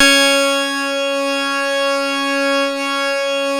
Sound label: C#, PIANO; Big Fish Audio; Synclav FM